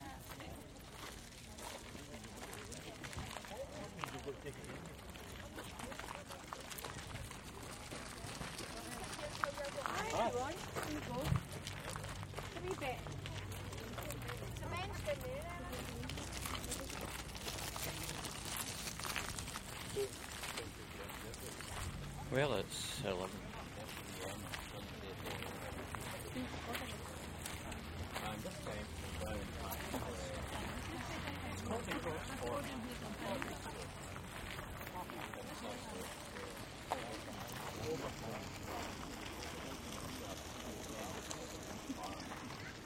car boot sale in the Clyde valley binaural recording soundscape; rossford